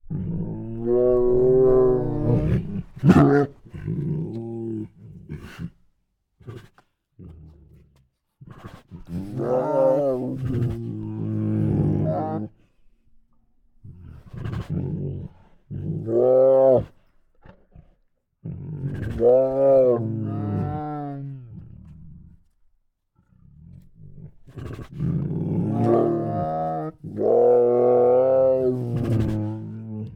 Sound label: Two Tigers Make Many Hungry Snorts; Sound Ideas